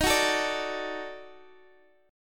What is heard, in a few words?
Eb Minor Major 7th Flat 5th, down, Guitar, Standard; strummed; chord; JGuitar